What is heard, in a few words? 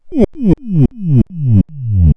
wupwupwup